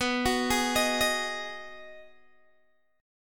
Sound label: B 6th B down Guitar Open G